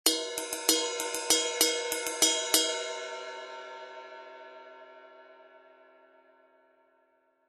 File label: 20in Rock Ride, cymbal sound